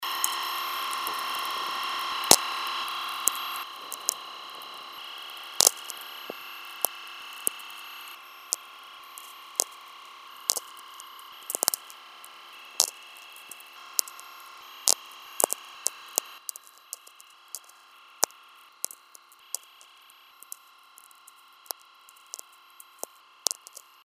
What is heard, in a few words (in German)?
Feld Mitte VLF